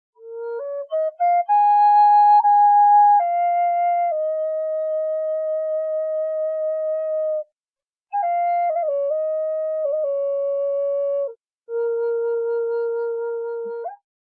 Native American Flute Key High D

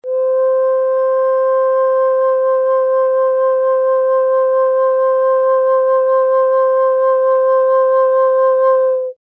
Medium C, Flutes Single Note

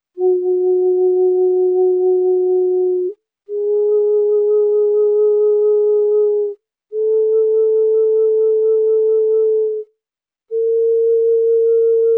chromatic 5 hole; Fingering Charts

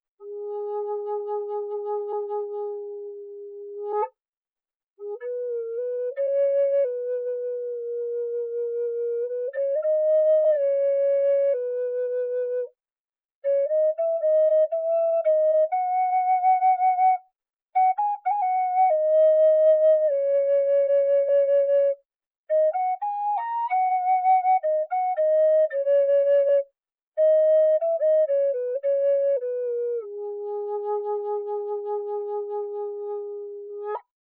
Large Ab Eastern Cedar, flutes

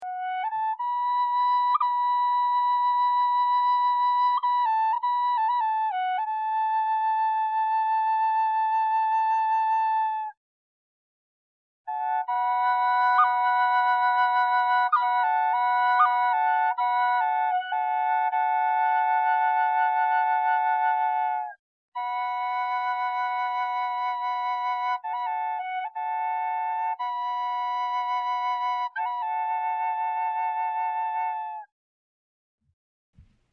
drone high F sharp, Native American drone flutes; Scales; Full Spectrum Scale

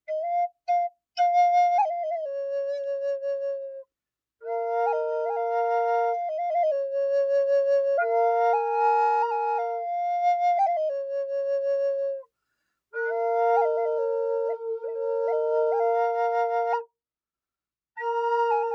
drone medium Bb bubinga; Flutes; FlutesDrones

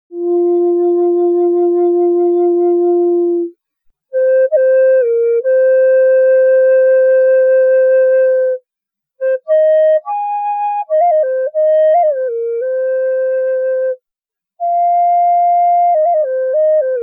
Large F White Oak, flutes

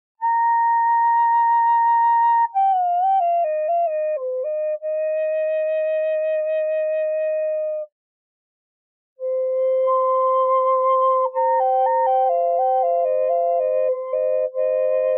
medium C sassafras drone; Flutes; FlutesDrones

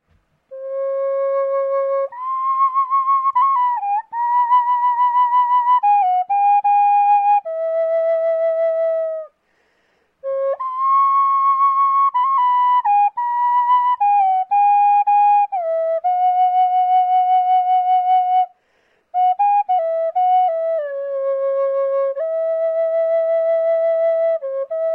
Medium C Sharp Cedar, flutes

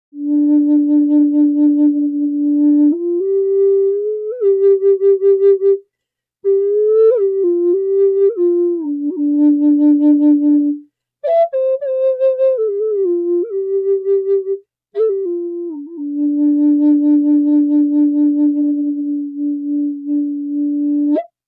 Bass D Leopard Wood, flutes

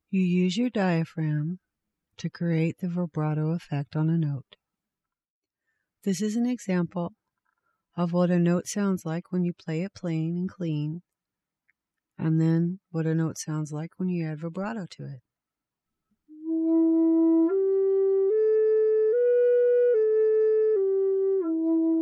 Plain VS Vibrato, Native American Flute

